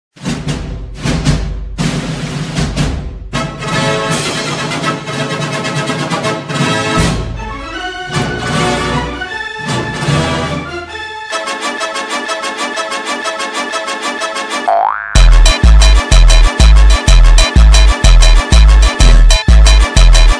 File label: Phonk slowed